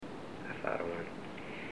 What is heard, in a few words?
evp nun shall way short; museum